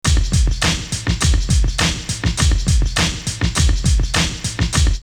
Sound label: sloppy loop 102, drum loops, old school; X-Static Goldmine